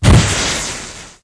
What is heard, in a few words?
eightball